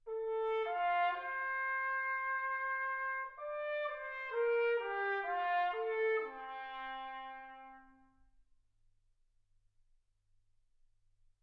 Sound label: trumpet